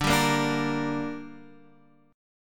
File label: D Suspended 4th Sharp 5th D, down, Guitar Half Step Down